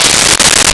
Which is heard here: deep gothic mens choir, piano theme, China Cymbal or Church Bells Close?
China Cymbal